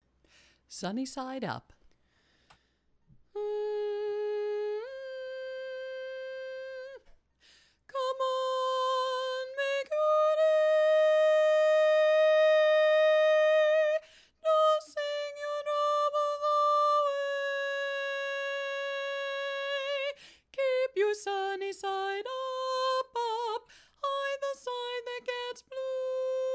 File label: tenor alone